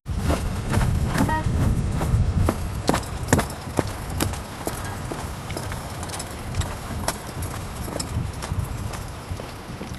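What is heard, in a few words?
wind breeze with soft flute; ceremony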